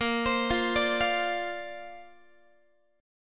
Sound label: A sharp Major Add 9th, Guitar; A#add9; arpeggiated; x,18,15,15,18,16